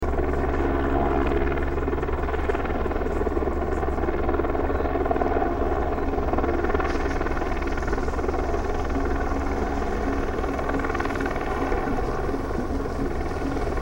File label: drumcircle; Boston